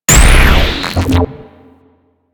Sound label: Antimatter Dissolve; Warframe; Null Star